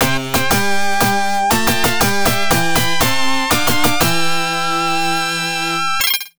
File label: Congratulations!; Sorcerer's Kingdom; Genesis